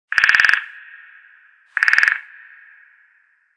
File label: Woodpecker adult